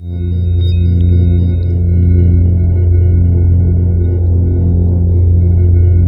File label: T WAVE, Synth Waves, Techno Trance